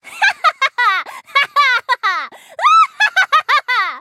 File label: laugh